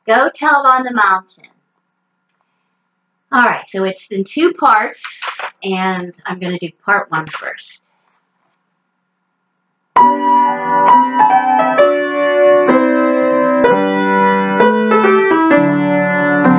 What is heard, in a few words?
women, choir; gotellitnorth